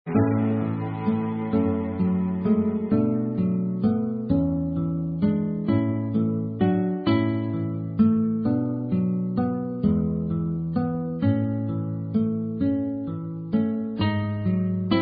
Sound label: Karaoke Songs; Girl Scouts